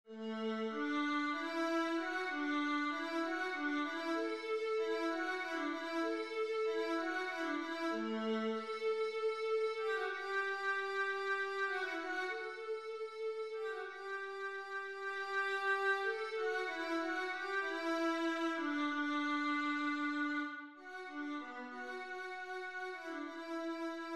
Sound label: WaterMusic vla